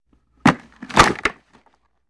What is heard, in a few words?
Football Helmet